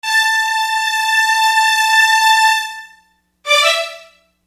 String Line; Construction Kit; HIP-HOP; Sonic Foundry (Sony Creative Software)

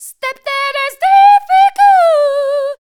female dance vocals

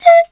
BAMB SH, BAMBOO FLT; Soundscan vol.60; Asian Traditions; AKAI

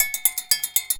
bulu grooves; Best Service; World Colours

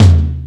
Floor Tom, Tom One-Shots; KJ Sawka - Drum n Bass & Beyond; Drum Tool Box

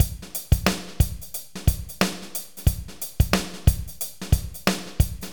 BPM 90 AMB

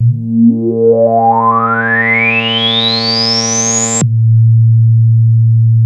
ARP 2600 A2, synthesizer; Hollywood Edge - Giorgio Moroder Rare Synthesizer Collection

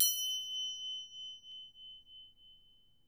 Percussion F#4, Harp; Miroslav Vitous - Percussion & Harp

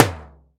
Tamborim

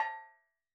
Steel Drums Layer A5, Calypso Steel Drum; Percussion

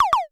Dual-Percussion-Synthesiser; Klone

